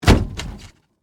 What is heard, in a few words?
Impact Wood Object, Fall, Over, Heavy; Wood (Sound Effects:Materials); BlastWave